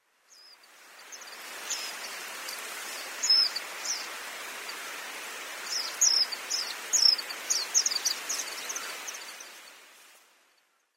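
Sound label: chosenmejiro, Chestnut-flanked White-eye; koe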